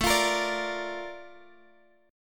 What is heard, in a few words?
Bb Major 7th, A, chord